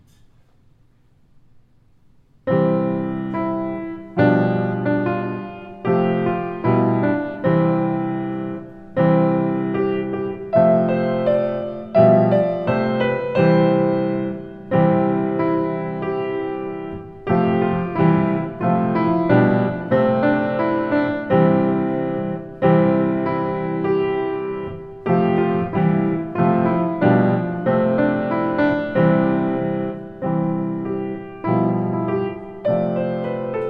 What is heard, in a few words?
Happy Chimes; Recital